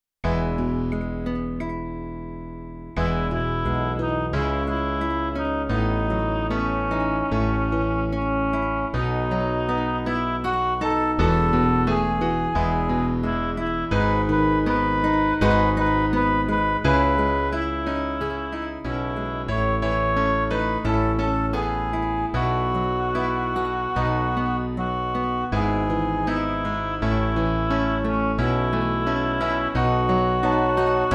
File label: midi